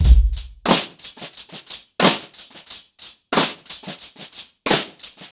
90BPM, ACOUSTIC DRUMS

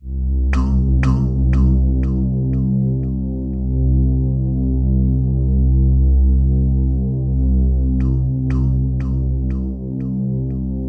C3, Synth Leads

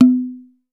DUNBEK; Voice Crystal - Steve Reid Definitive Percussion

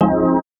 chords

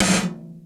GTOM, drum; Crypton - DrumBase5000; GATE KITS

